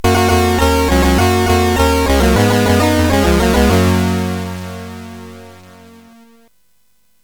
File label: Super Cup Football, CSG8580R5; hvsc